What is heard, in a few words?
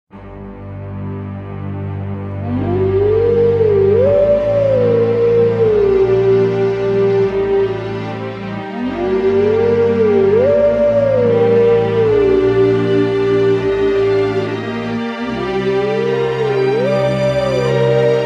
Howl Song, Duo; the legend of zelda twilight princess